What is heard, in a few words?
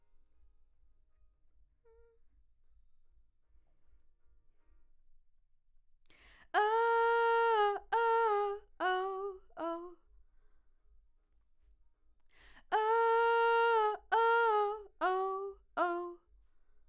Vox start new